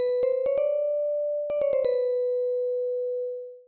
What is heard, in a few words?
sleep